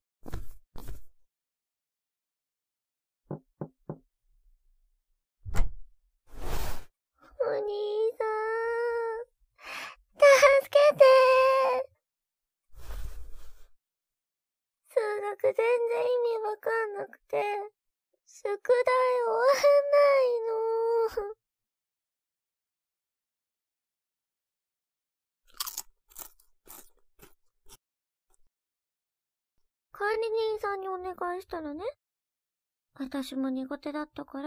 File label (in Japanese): 声 OK 飴 ASMR